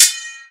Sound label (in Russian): mech zvonkij priglushennij